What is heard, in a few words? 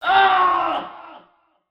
scream